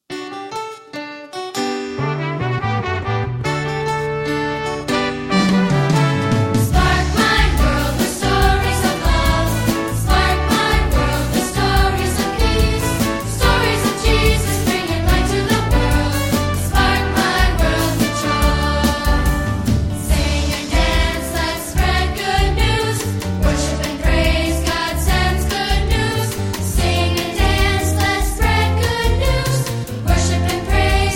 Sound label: Vocal